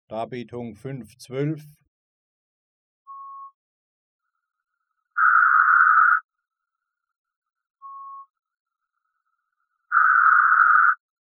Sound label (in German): Gedrosselte Lautheit; Die starke Drosselung, die ein 110